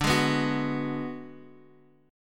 D 6th Flat 5th D down Guitar Half Step Down, chord